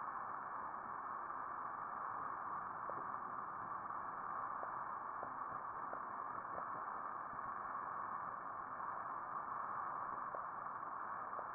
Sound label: cusk-eel chorus